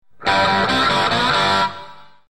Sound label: guitar rock sms